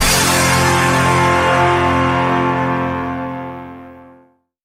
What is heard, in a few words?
cymbal crash; Notes